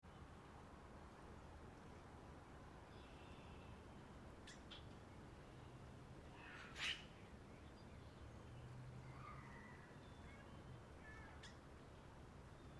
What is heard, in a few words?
Birds Suburbs Southern Ontario Light; Spring; Animals